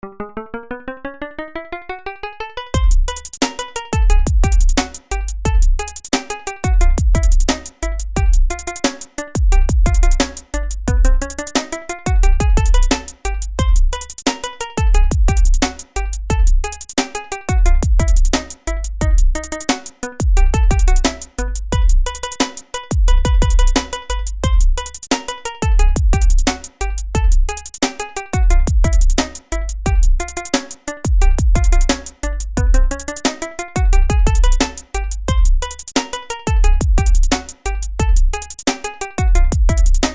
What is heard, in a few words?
circus beat